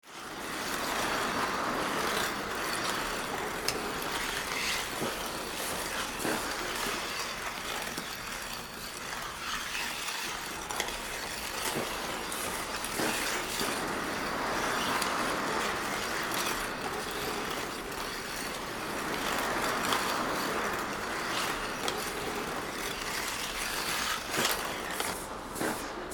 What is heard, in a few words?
Ice hockey arena ambience skating no crowd